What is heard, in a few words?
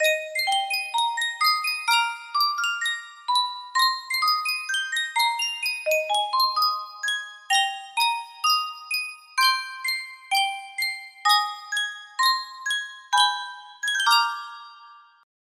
Yunsheng music box, music box melody